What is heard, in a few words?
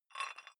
dumbbell thread take off small weight gentle, fitnessstudio